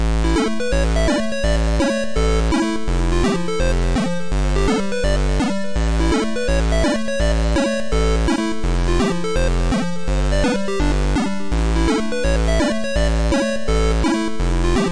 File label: Bottled Bubbles